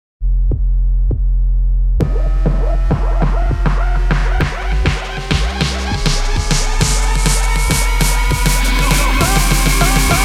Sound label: Hip Hop Electro; OF FATHERS AND SONS – Soundtrack